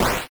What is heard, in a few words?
flame; Mindustry